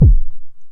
Emu Kick, Emu Modular Drums

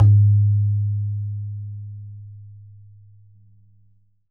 BAYAN, Drums Percussion

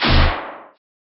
metal fight

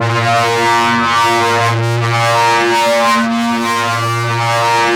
FLYER A#1; Music Store; 90's Sample CDs

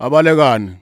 Vocal Chirp, African Voices

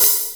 SR16 Hat, Alesis Sr16; Drums

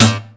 GUI Pull G2 Akus; Best Service Gigapack 2

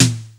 snare drum; Crypton - DrumBase5000; 52 SNARES

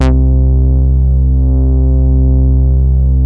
BOUNCE, BASSES

